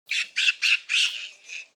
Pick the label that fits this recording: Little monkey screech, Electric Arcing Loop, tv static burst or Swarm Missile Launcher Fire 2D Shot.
Little monkey screech